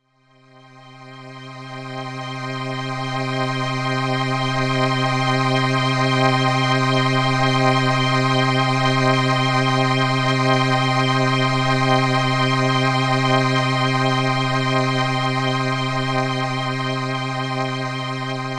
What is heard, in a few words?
Eminent 310 chord